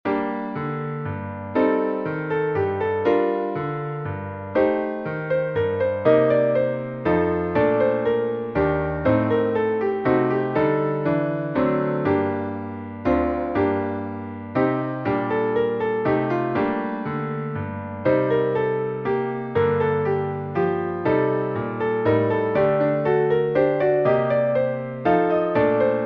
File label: te deum klavir